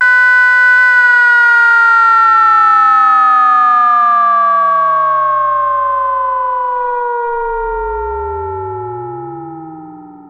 VCS3 FX, Synth

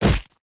rabble hit; ZEUS

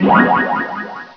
START; Tetris Collection for Windows 98; bakudemo

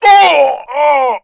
death; PC Gamer IT CD 41; Daikatana; superfly

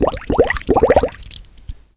Bubble

